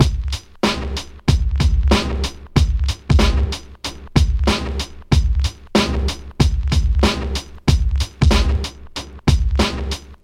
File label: 94 bpm 2000s hip hop breakbeat c sharp key, kick tuned to the C# note